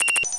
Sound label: turret hi